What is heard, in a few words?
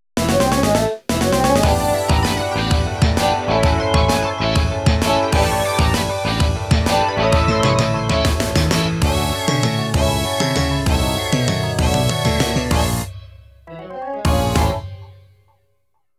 Shuffle